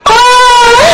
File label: oooooooah